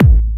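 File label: bass drum Punchy, kicks; VEC1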